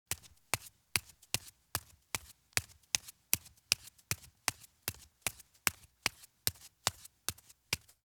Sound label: Bare Feet Take Fast Steps on Concrete Stairs; Sound Ideas; Footstep Sound Effects